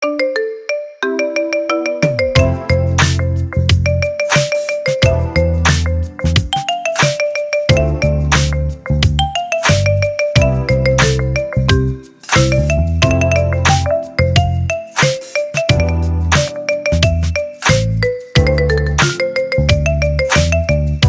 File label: marimba remix; dzwonki na telefon; Android i iPhone